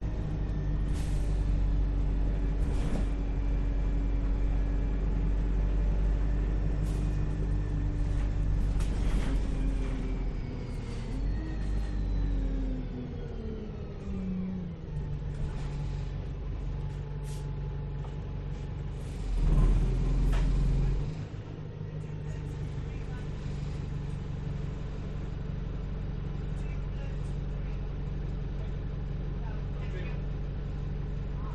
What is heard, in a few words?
urban bus journey interior perspective newcastle upon tyne uk